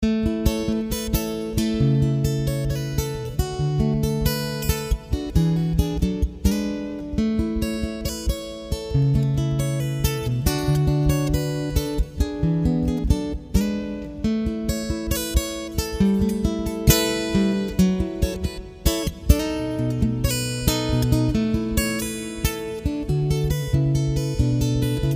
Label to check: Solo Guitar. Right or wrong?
Right